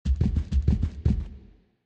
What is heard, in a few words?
wild Walking; godsoffortune